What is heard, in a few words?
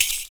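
PERC, percussions; Univers Sons - Raricussions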